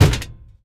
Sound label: bang